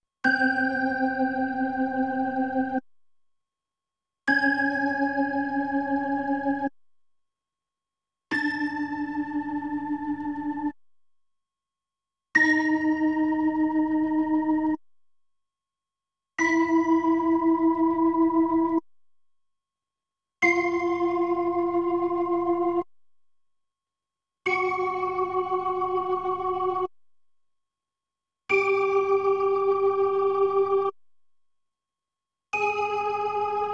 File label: B3 Organ; Masterbits CLIMAX 8